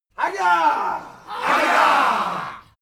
native american shout

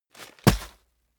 sand bag drop

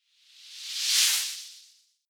whoosh simple flutter phase high

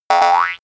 boing classic long

